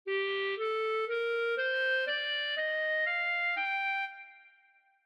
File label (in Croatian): a mol, ljestvice, clarinet